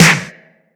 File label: wet acoustic snare sound e key